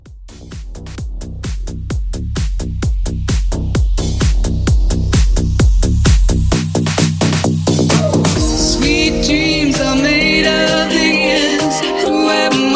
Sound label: House Mashup 130